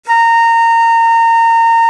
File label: VENU A#4, Old World Instruments, World Winds; E-MU Producer Series Vol. 7 – Old World Instruments; World Combos